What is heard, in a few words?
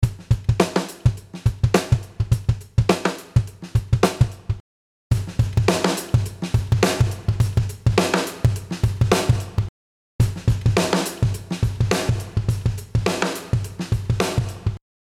Dry Neb 1176 Type UAD 1176LN